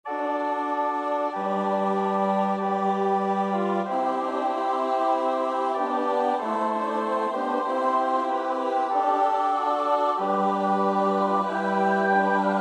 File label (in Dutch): S-A-T-B, koorpartij oefening; Anerio (Giovanni Francesco); Messa di Papa Marcello